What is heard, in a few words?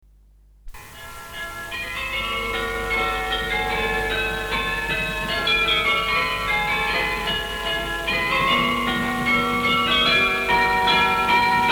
munchen bell